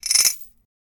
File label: Chain Fall Past Cup